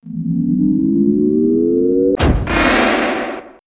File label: Elec FX; X-Static Goldmine